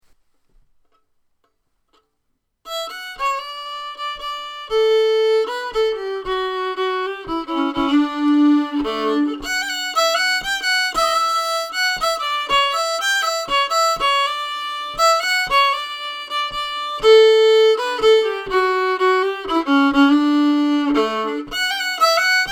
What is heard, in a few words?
Direktrvalsen Slow